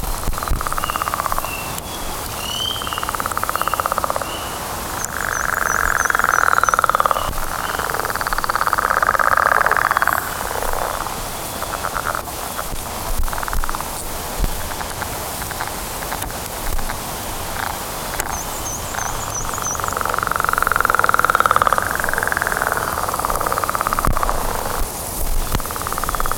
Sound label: leopard frog; frog calls